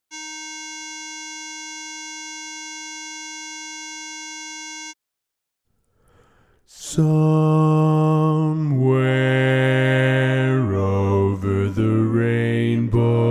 bass

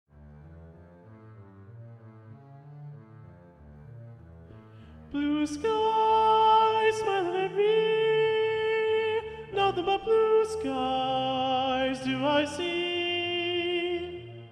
alto predominant

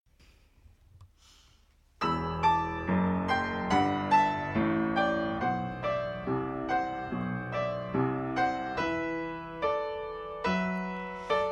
alto

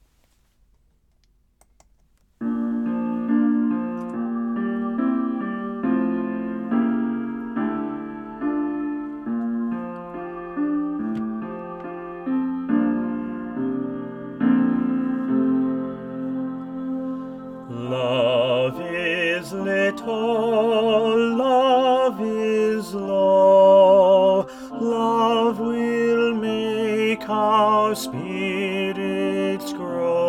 tenor

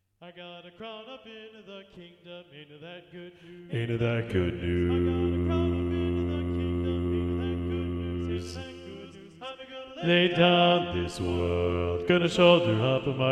bass 2 predominant; ttbb

